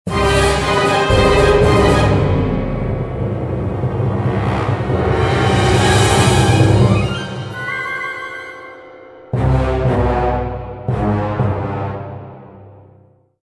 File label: Jingle Escape palace; Ankh